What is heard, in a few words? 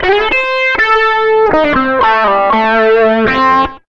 SOLO R, Electric & Acoustic Guitar Loops